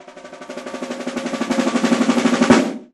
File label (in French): tambour